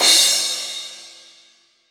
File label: long crash cymbal drum sample a sharp key